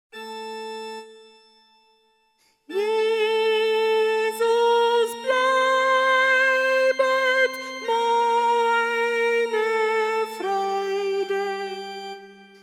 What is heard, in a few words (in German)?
Sopran; Jesus bleibet